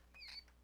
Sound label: Squeak Churr, rat